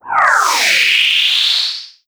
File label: Hoover Car